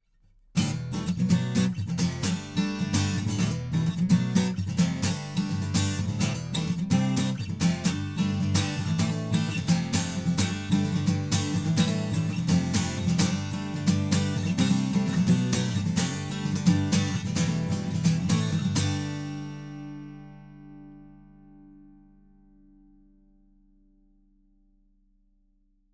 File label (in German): Fame Pro Series SD-100 test kugel strumming 12 bund l schallloch schraeg r